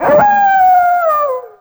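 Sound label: cerberus battle; sinfar haks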